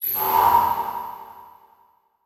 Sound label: Transition Whoosh Short; Production Element